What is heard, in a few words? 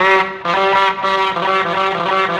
guitar rasp